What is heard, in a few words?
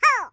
yah wah hoo; yoshi; SM64 CO-OP